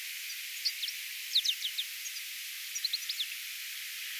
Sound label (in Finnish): tuollainen kaunis osa tiklin laulua